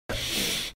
sniff

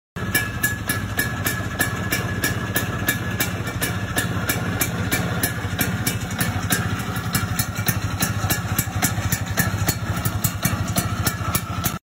Vespa

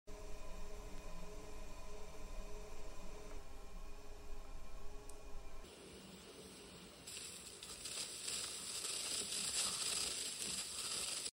pottery

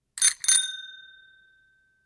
Cycle Bell

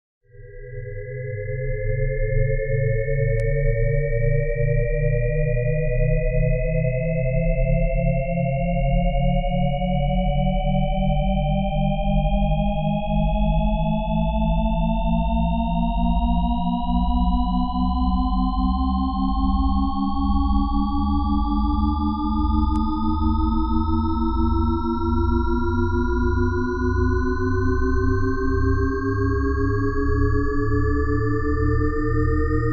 brain waves

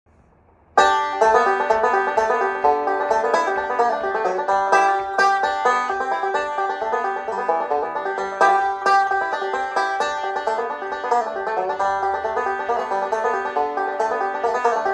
banjo

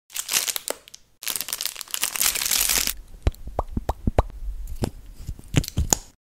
ASMR Mouth

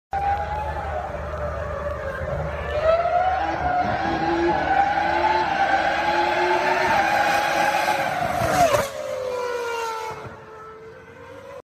Formula 1 Car

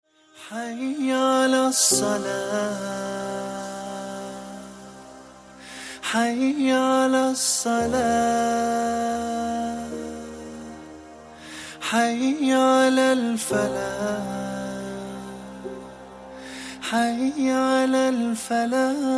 prayer ringtone

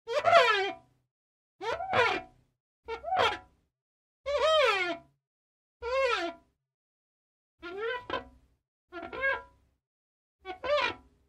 Dragging finger on glass

